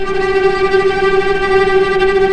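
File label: cellos tremolo G4 piano right, string; East West - The Ultimate String Collection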